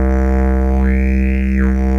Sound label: snap